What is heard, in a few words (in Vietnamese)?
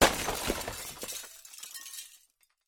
Tieng pha vo kinh dap vo cua kinh